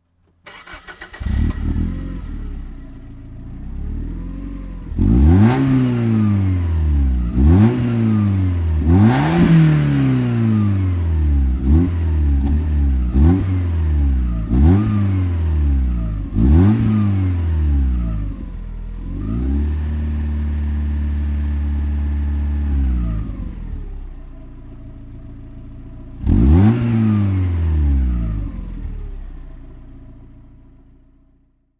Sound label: Toyota Celica 20 GT